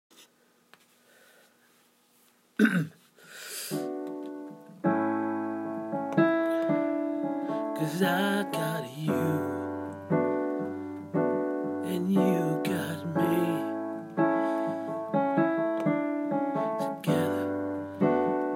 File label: piano song idea; Broadway